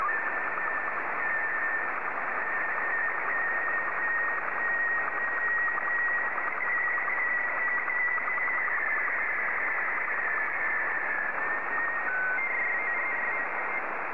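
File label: Robot 12 black and white, slow-scan television; SSTV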